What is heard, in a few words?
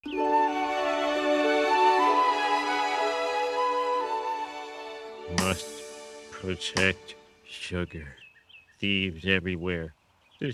sugar w auto drums